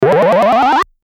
Game, transition

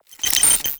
Glitch, noise

